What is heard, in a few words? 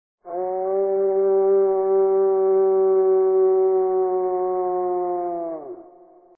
ahh